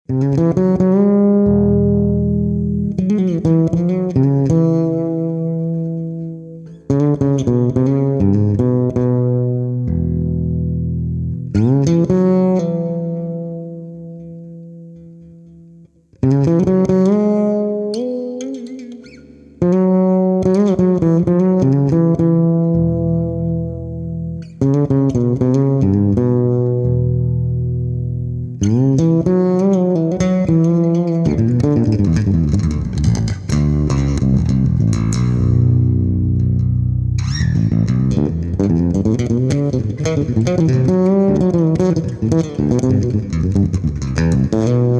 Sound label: swamp Blues